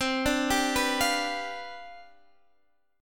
C Major 7th Suspended 2nd Suspended 4th C down Guitar Standard, chord; CM7sus2sus4; arpeggiated; capo=2